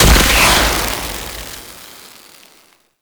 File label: Glaive Frost Explosion; Warframe; Tenno; Teshin